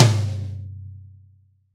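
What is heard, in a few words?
Reg. Low Tom, Roland BK-5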